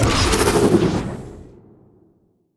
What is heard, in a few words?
Cloak Activate; Titanfall 2